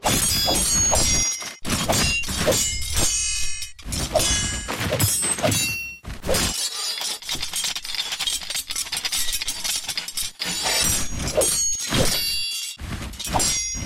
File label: sword battle